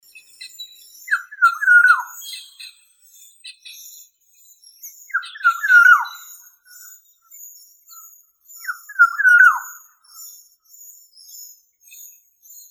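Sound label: black-headed oriole, Chinese, Oriolus chinensis; ivolga chernogolovaya; kitaiskaya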